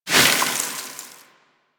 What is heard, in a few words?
Sword Elemental Ice Whoosh; Warframe; Teshin; Weapons